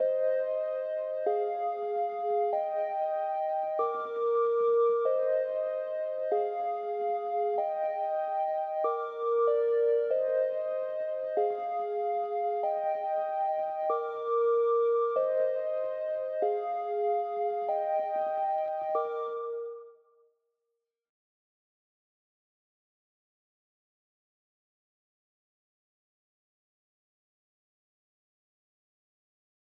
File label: Intro - 95 BPM D# Maj Lead, Hip Hop; Melody Loops; Loop Stems; Cymatics